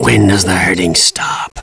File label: pain